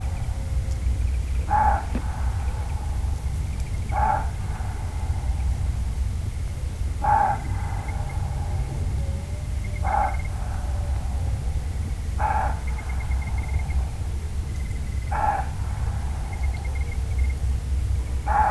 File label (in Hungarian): őzkiáltás éjszaka; termeszetben